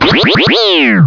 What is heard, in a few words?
Alien